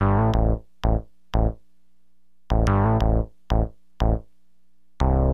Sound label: MG BASS, HipHop; LiveAct Pool